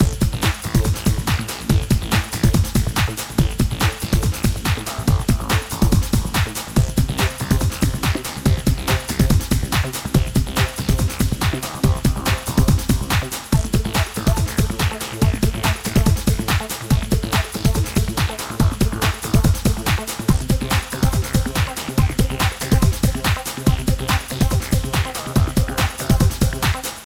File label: Loop Start; Xpand Rally